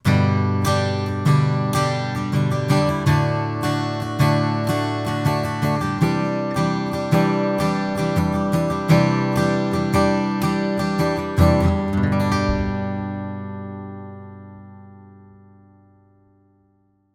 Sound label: rode nt1 signature gtr; rode nt1 signature test